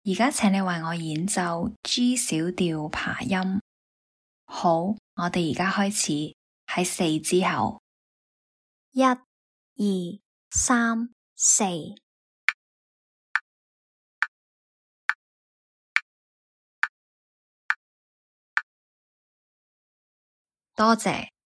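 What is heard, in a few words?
G minor arpeggio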